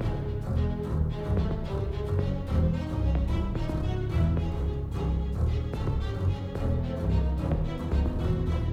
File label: bonus Music Layer; netent